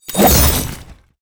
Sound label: Big Chain; helltaker; Boss Big Chain Show